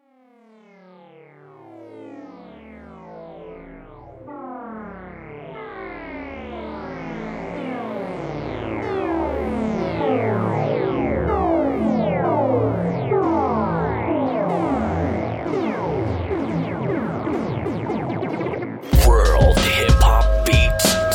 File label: 94bpm, beats